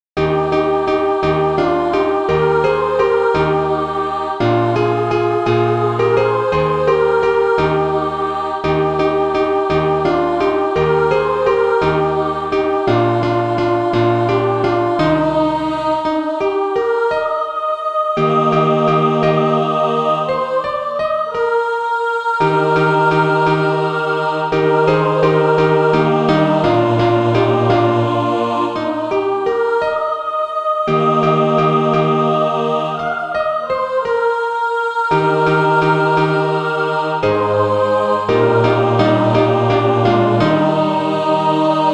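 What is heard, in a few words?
mixed, Practise Music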